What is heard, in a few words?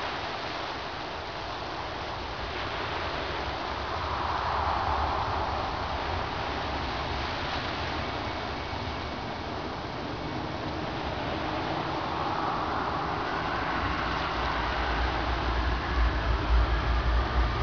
wind loop; ambience